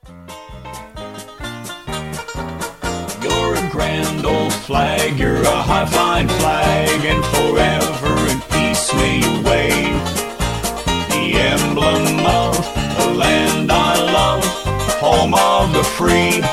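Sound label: Instrumental with background vocals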